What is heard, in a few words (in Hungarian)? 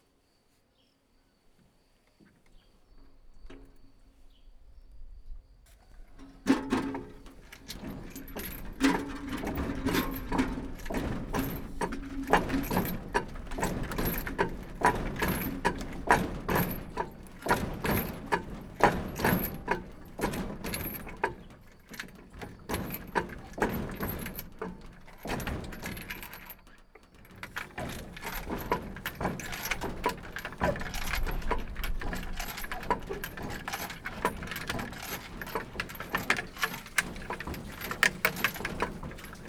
masodjara, kerekes kut; Varbóc